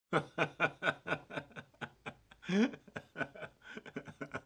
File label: man laugh; WMBonusXP